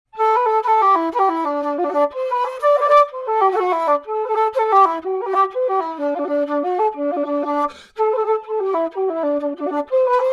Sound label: whis flute; humofballyl